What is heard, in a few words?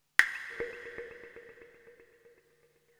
FX, ambient, 160; eJay